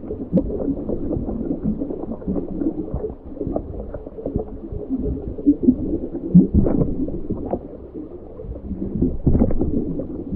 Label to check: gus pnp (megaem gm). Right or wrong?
Wrong